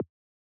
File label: button release; wayvr; wlx overlay s; wgui